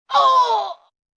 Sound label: Impact Ohh, Grunt, Impact, 1 boy; Pain; VoiceBox